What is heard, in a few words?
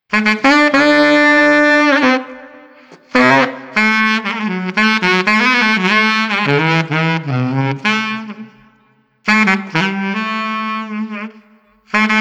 Street Alto Sax 100